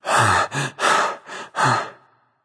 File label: nv breath, vox; smite; voicepack; vulcan